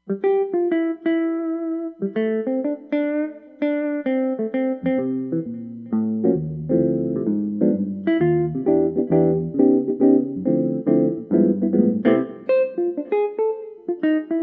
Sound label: Jazz Guitar, Roland Fantom XR